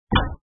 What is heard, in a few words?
door close; grade5; reading diagrams